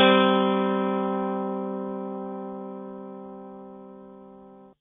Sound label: steel guitar; Switch, Wii U; gamerip; Pad of Time